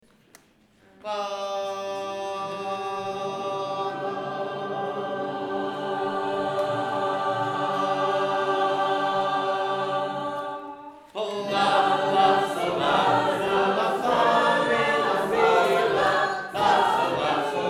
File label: Singing School; Jefferson; Poland Singing School 2011